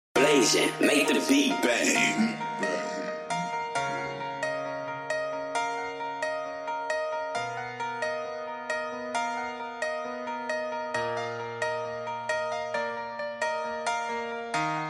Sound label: Instrumental